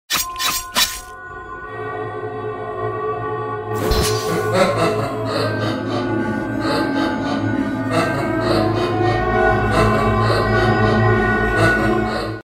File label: Cinematic Music